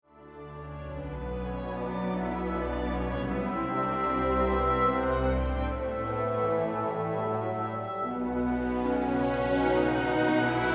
orchestral

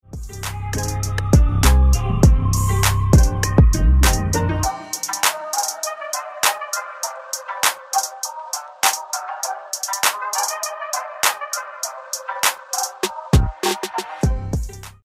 hip hop

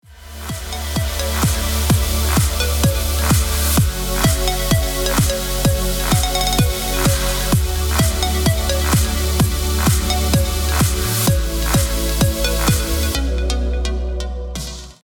edm

